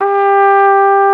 Flugelhorn; Roland L-CDX-03 Disk 2

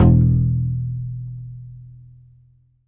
C. Bass Balalaika, Plucked Strings

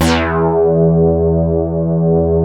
Prophet 5 E3, Synthesizer; Hollywood Edge - Giorgio Moroder Rare Synthesizer Collection

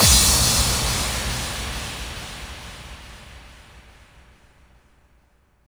D&B Crash; neuro

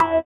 sitar, Indiatek; Spectrasonics; Metamorphosis

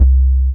DM BAS, Dance Mega Synth

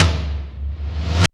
REV TOM MD; Back In Time Records; Big Bang; ST.REV.TOMS

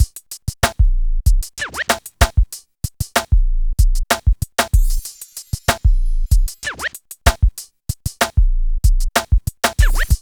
BEAT 95, Beats 93-96bpm; PlatinumPhattCD1; E-MU Producer Series Vol. 8 – Platinum Phatt

